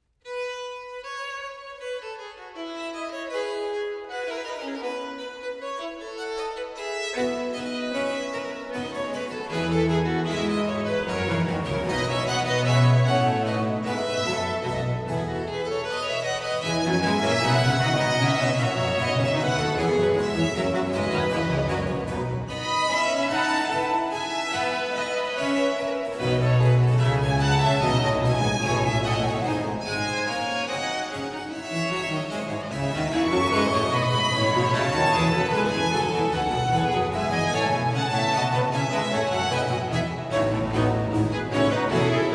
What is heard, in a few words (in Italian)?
Allegro [fuga]; Arcangelo Corelli; Concerti Grossi op. VI; Concerto II in fa maggiore